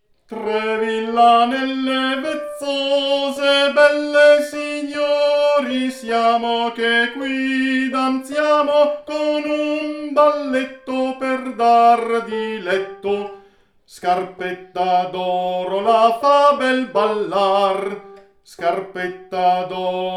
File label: Soprano; BALLETTO DI VILLANELLE